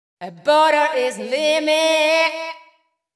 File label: VOICE GRL HOUSE 125 A, House Voice